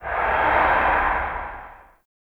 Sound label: Low Mech; Ambience; Warframe; Nokko Colony